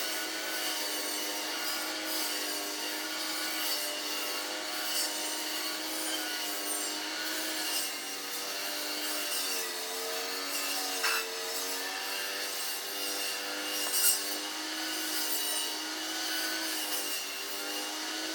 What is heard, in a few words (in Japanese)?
kusakari